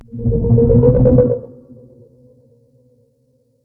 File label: MOLOCH attack